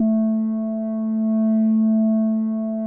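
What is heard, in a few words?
A-2 PR6 ORGAN, SYNTH VIBRA; AKAI S-Series CD-ROM Sound Library VOL-7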